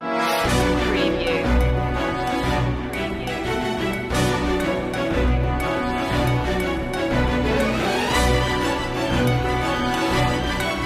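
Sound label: no choir no percussion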